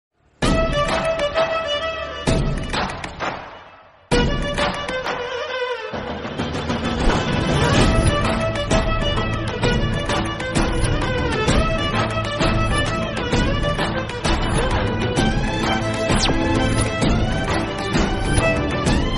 bgm ringtone

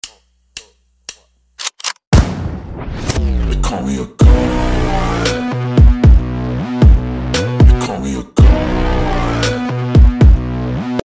telugu bgm ringtones; allu arjun

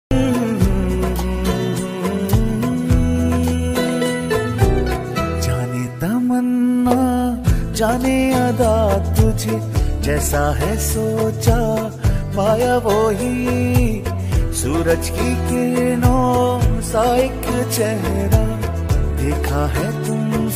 drama ost ringtone; Ishq Murshid; Bilal Abbas; Durefishan

